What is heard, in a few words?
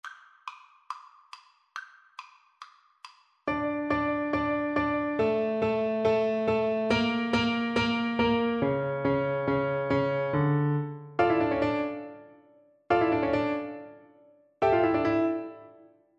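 recorder karaoke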